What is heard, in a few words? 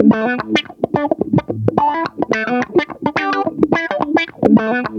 LICK, Funky GtrLicks; E-MU Formula 4000 Series Vol. 1; Hip Hop Nation